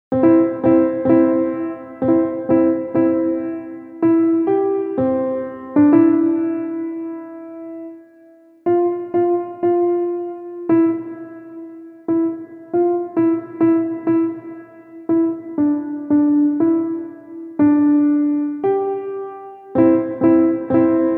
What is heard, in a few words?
Jingle Bells